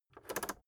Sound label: rotary control full turn